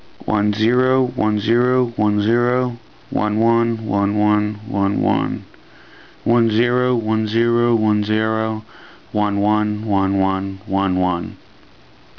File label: trumpet